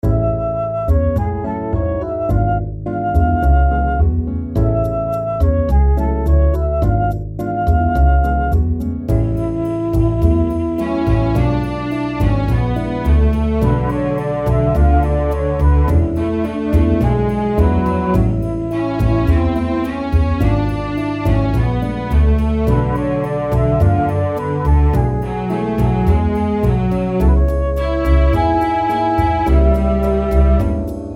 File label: Dreamy Bossa Nova